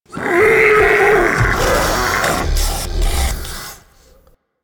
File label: Ancient Tank Pain, Infested; Warframe